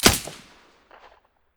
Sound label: aug silencer stereo, weapons; STALKER; IWP